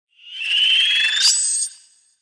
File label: mad slug die